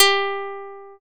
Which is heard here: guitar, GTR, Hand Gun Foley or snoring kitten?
guitar